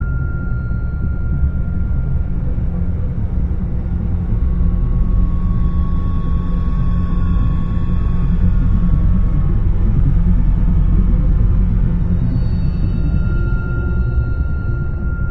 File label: loop Tense